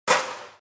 Autowerkstatt Werkzeug

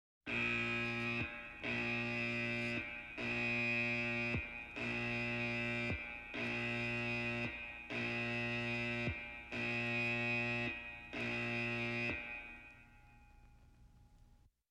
Marine Marinealarm Summton

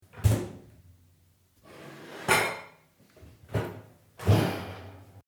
Kueche Atmosphaere

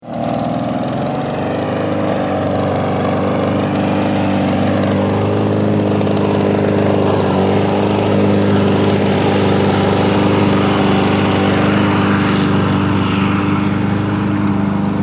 rasenmaeher

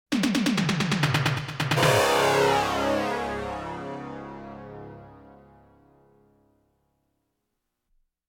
Science-Fiction Space Rock

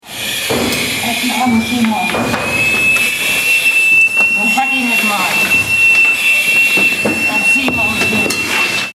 Feuerwehruebung wenig Luft

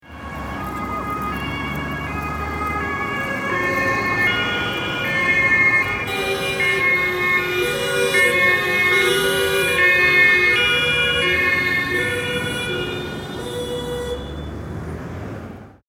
Polizei Kolonne

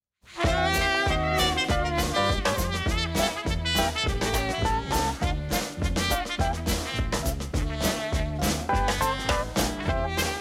Stimmungen Jazz

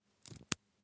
Kopfhoererstecker

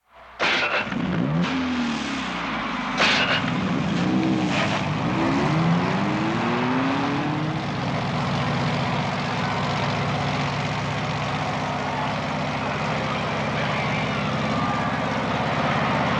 Sport Drag Race Start

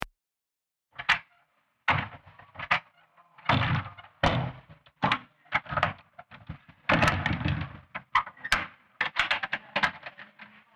alte Tuer

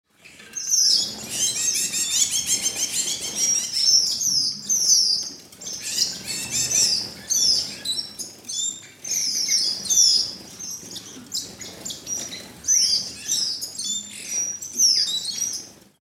Voegel

